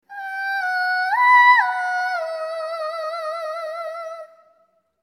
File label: Female Vocal